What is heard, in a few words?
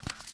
Tape Take; Game rip; MiSide